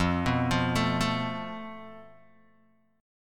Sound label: F Major Flat 5th, Guitar, DADGAD